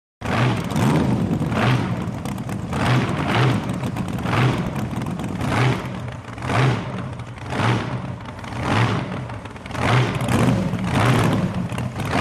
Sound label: Drag Boat Idle revs